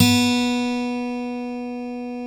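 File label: B2 acoustic guitar, 6-STRING; InVision Interactive; Lightware VOL-1; Instruments & Percussions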